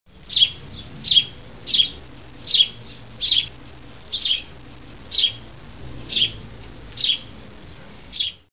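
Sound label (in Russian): звуки воробьев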